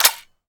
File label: Sfx Jammed Weapone; Akxolotl